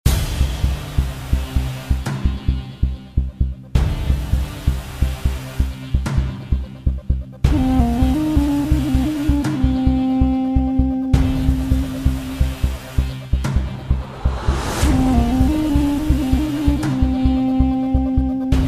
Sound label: Infiltration duduk, trailer music